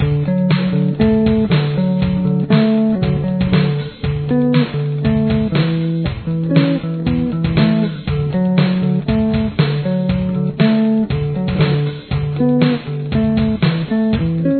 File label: chorus bass